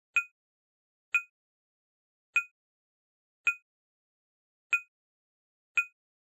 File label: menu; pokemon